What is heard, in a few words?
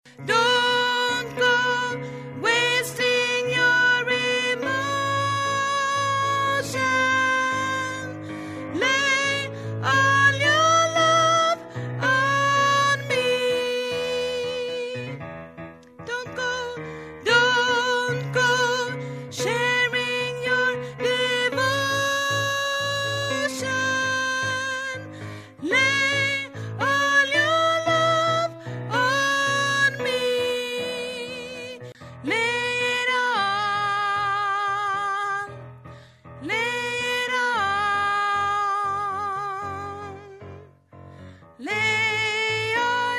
tenor